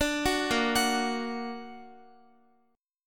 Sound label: A sharp Augmented Guitar; arpeggiated; tuning 4E,4A,5D,5G,5C,6F